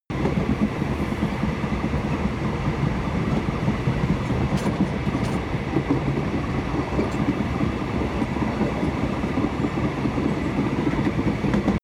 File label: train wagon interior drive medium loop